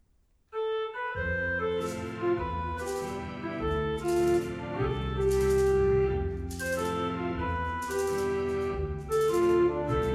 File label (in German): Orgel Solo